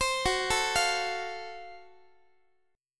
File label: F sharp Minor Major 7th Flat 5th F sharp down Guitar; arpeggiated; x,x,15,16,13,16